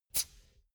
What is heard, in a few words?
tire pressure control release